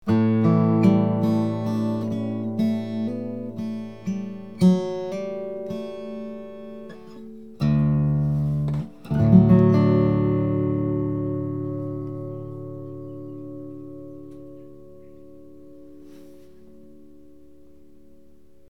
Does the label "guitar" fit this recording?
Yes